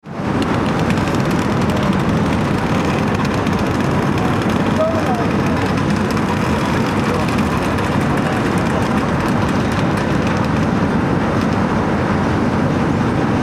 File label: sea shanty